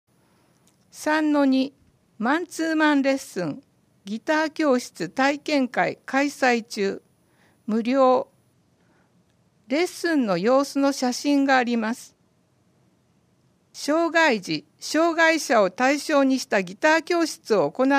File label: guitar; 広報いくの令和6年10月号（No.341)